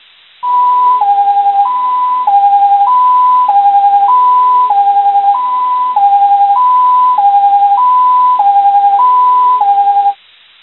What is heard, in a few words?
ambulance siren; made with Voicemod